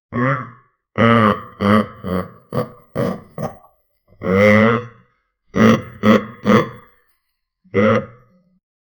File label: surprised cow; animal vocalization